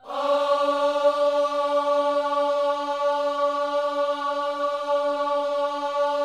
OHS D 4B, Gospel Ohs; Spectrasonics; Vocal Planet

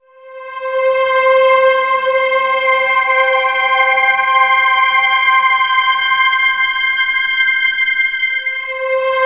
FILMSYN C5; Best Service Dream Experience

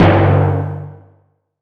Big Conga; Zero-G Sound Sense Grime Scene; GS Kits

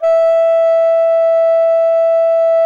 E4, tarogato; SoundBytes - Early Patches

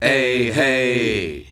EHEY C, MALES; Best Service; Hyper Dance

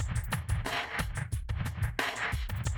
Classic DrumLoops

